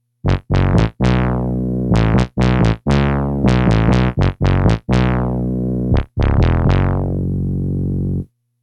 wow bass cz

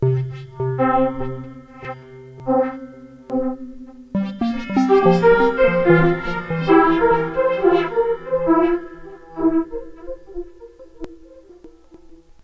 delay voice

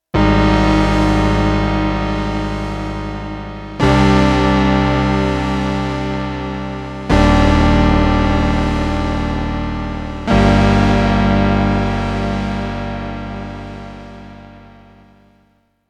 Vintage Dirt esq1

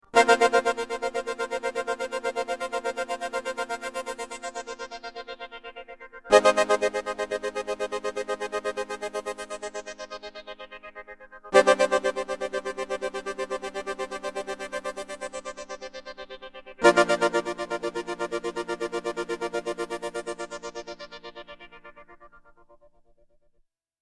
esq1

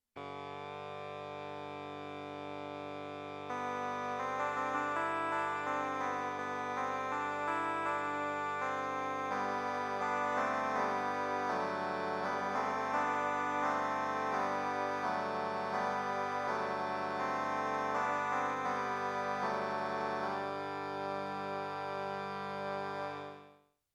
Bagpipes

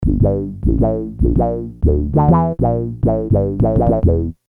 Funkwow Bass

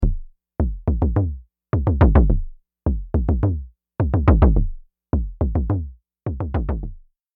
BD1 Mother32

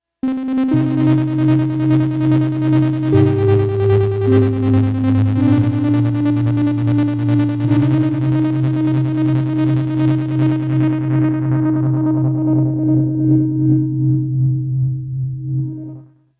esq1

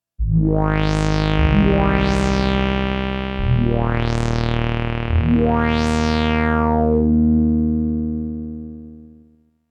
baswah esq1

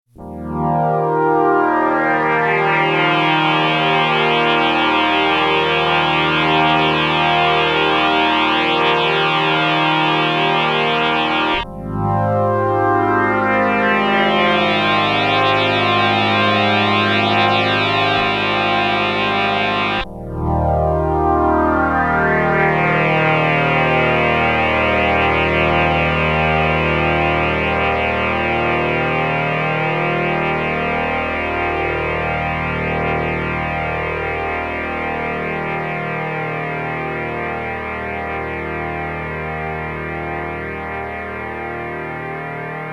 Pad cz; GingersPad

